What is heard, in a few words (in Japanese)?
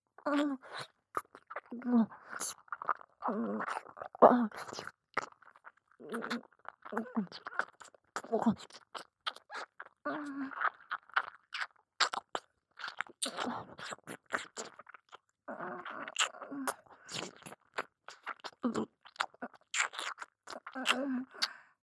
耳舐め（奥まで）; 性欲旺盛な陸上部の先輩後輩JK、ベロチュー青春溺愛えっちでコーチの汚ち◯ぽ生ハメ特訓♪